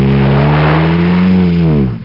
DECELERA; ddmrally

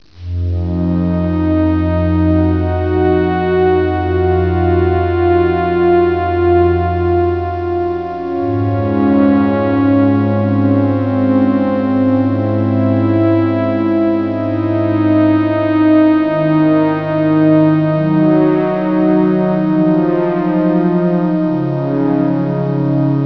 Sound Music

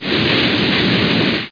SPELL

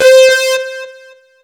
lead, synths

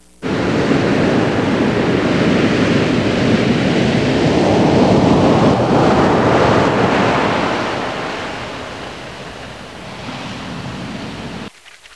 surfs up, nature; Sound Effects for Multimedia - Volume 1

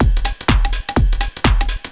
DRUMLOOP; eJay

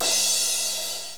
crash

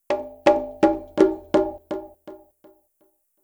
PERCUSSN DISCO 125; Drum

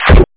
TSCHUP; PSION CD 2; Psion Voice

